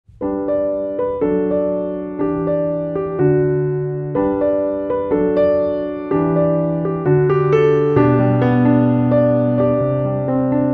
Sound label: love tune